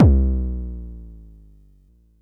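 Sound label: Long bass drum, Jomox Airbase99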